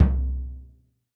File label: bassdrum oh, gretsch kit